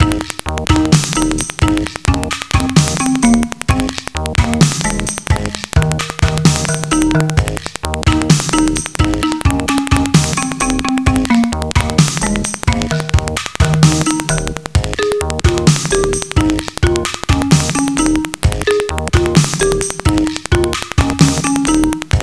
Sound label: Africana, Latin; Sound Factory